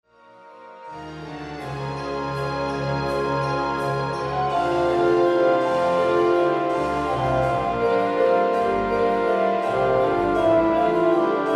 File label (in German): Vibraphon-Konzert